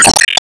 Ball Hit Killer

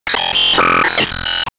Enemy Masquerade Active Loop

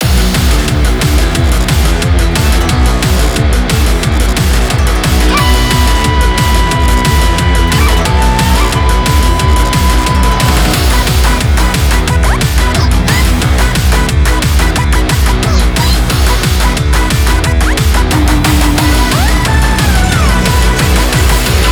Bgm Bomb

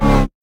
Boss Core Hurt; Avie

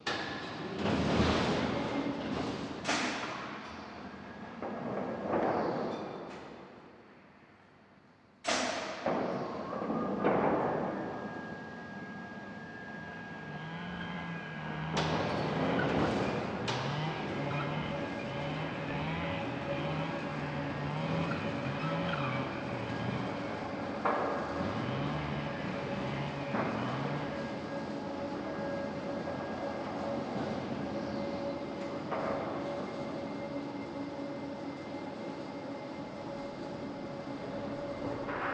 Ambient Garage

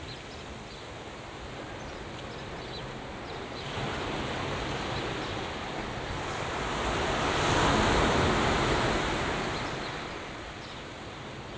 ambience; Rock 'n' Roll Climber; Wii

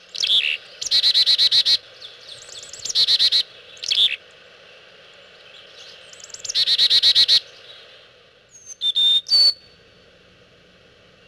birds; leisure suit larry magna cum laude

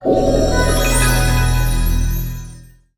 jingle success small; Venetica Gold Edition

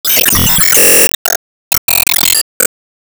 Se Sp Superball Shot

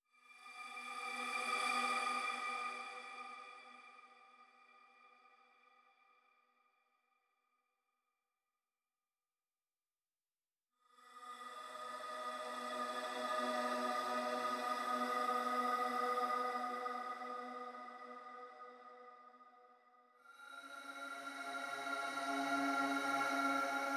Moisture Deficit Pads 120

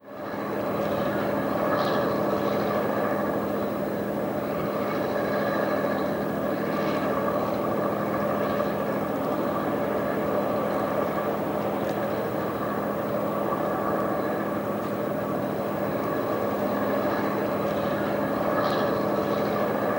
Boats

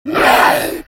Zombie Atck

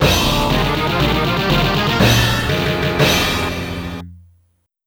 Game Over; Ghost Pilots; Neo Geo CD

